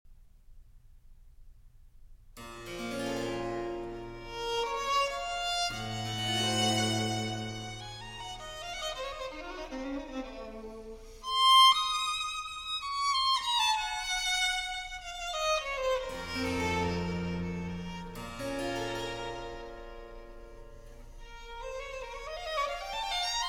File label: Violin Concerto in D major